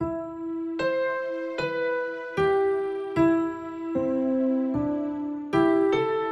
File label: Nostalgic Melody Loop - 152 BPM A Min Piano; Melodies; Loop Stems; Cymatics; Oracle Sample Pack